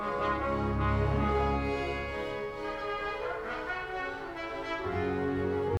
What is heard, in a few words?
Trumpet